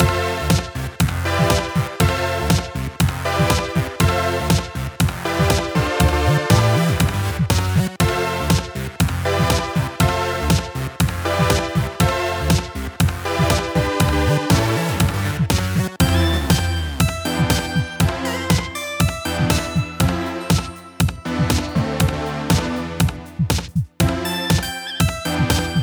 Lobby; Inkopolis Square; Splatfest, Right

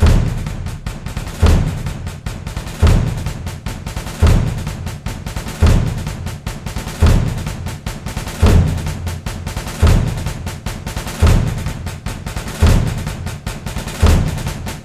Drum Loop

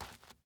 dirt step; teardown; ps5 windows xbox series xs

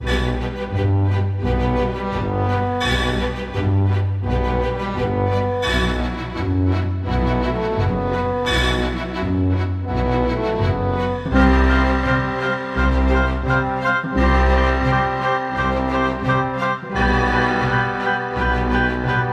Background; widgets odyssey; psp

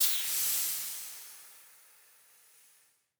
doors

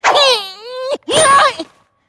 Porky Rage attack

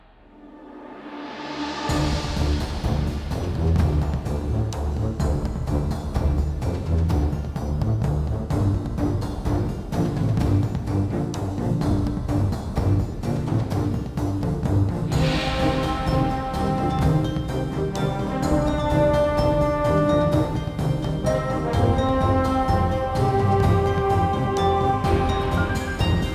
Boss Loop; Gods Remastered

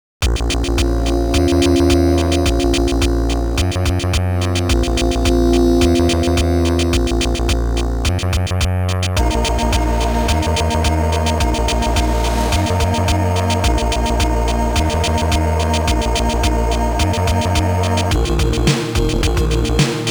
Sea Monster Boss; Turrican Anthology Original Sound Version Vol. 1

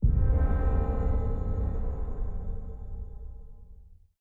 Challenge Fail

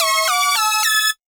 Beat

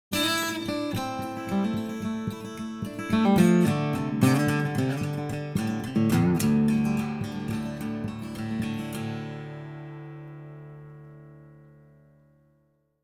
Jingle Start; Planet Horse

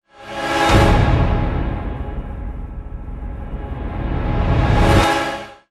Sting Game Victory

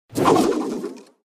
Archer Skill

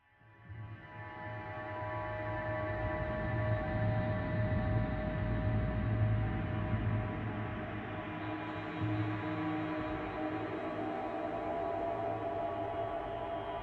Exploration

